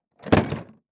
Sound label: Industry Tool Box Put Down Rattle